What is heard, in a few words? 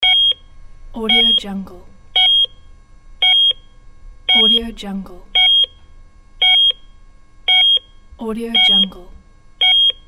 hospital heart monitor; mega loop